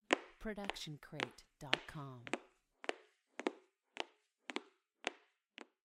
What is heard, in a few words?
walking indoors; soundscrate